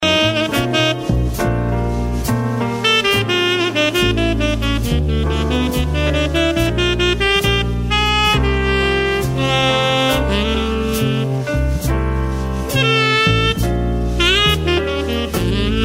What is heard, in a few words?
jazz